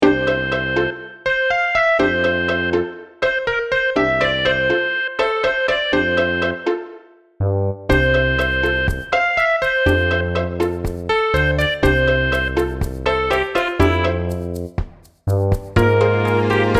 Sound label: upbeat; realfont